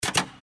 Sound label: switch